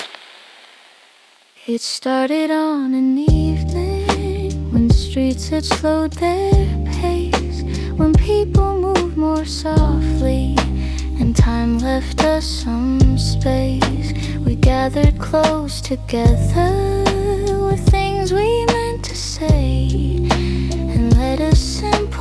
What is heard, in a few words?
Evening Song of Winter, jazz